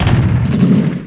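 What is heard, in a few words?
cruiser cannon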